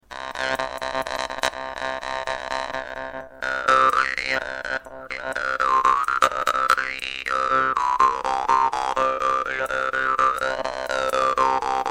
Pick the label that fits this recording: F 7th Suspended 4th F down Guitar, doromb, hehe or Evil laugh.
doromb